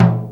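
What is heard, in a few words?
Japanese PERCussion; USB Soundscan vol.60; Asian Traditions; AKAI; JAPAN PRC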